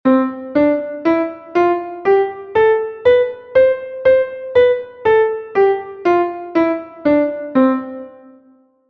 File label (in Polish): Staccato gama C dur; portato